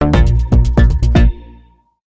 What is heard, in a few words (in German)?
Deutsche Bahn, Klingelton; Filmmusik